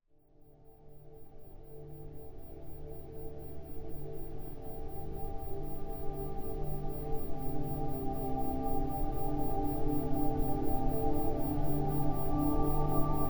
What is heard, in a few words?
concentration binaural